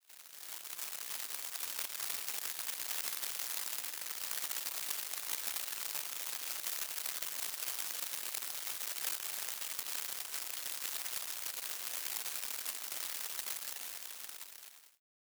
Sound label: light rain hard ground